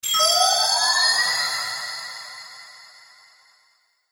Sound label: Wizard Spell Of Lifting; Magic Sound Effects